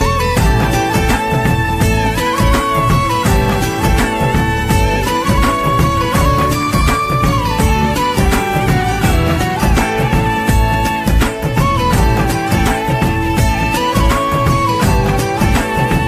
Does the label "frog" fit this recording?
No